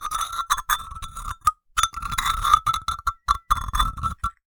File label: Cowbell Chain, Percussion